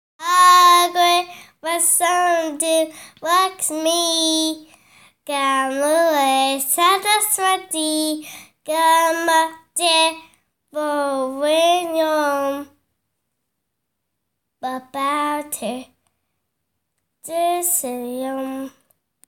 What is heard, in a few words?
Karagre Vasate Lakshmi Mantra Sung